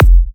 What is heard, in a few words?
F, kicks; markus hakala kicks